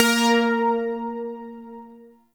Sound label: Shawzin Oct Two A Sharp; Music; Warframe; Zariman